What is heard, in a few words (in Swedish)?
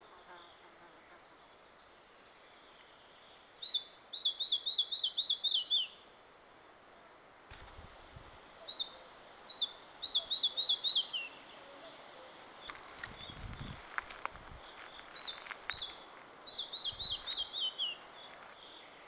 Mindre flug